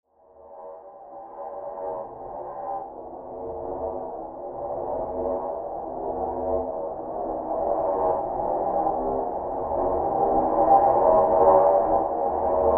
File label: Sweeper Dark Ambient Long; Production Element Imaging Element Accent Transition